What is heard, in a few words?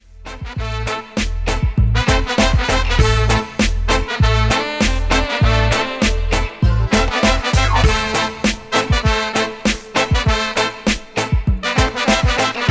Dub